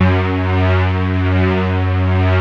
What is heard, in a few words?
PAD, Vintage Voltage